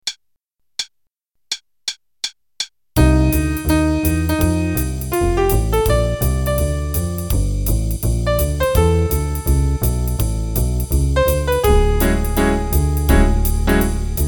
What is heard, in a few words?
Play a long, ending qn=166, Jazz Improv Play-a-Long